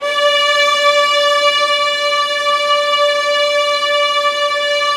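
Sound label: violins E5; ILIO; Synclavier Strings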